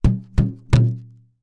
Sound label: Cartoon Balloon Hit Hand Hit Multiple